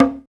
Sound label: BALA, African Perc; Spectrasonics; Supreme Beats; African Contemporary; SB Afro DRMs